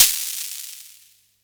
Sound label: Noise Crash Block; FREE SFX PACK VOL 3